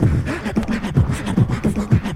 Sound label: heavy breathing, Beatbox; Drum loops